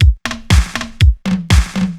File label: Fill, Drums, Eclectic Electronica